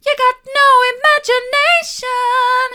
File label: you've got no imagination mid, vocal